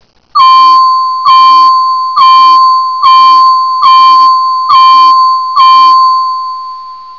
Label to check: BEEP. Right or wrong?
Right